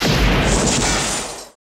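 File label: laser gun; Total Control - Action Cutz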